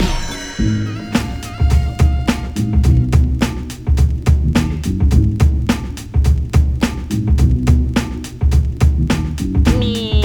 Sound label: Cartoon Break; Bugs and Tweety